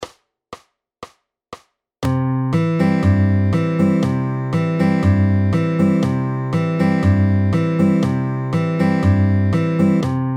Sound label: FAST, fingerpicking